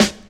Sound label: 90s hip hop steel snare drum g sharp key